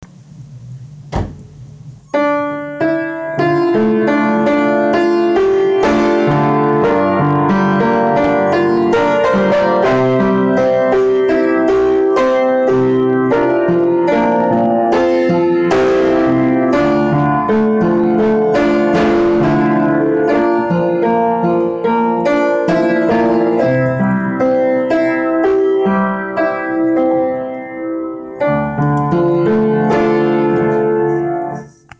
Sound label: Little Tune